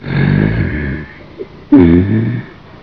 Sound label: growl